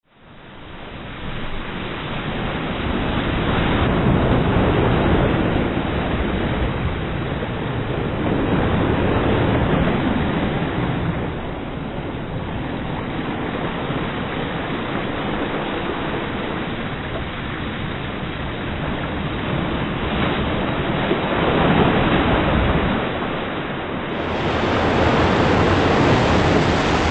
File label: Waves Into Tower Fall; Opening Mix